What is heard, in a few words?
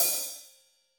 HH, Drums; Bob Clearmountain Drums I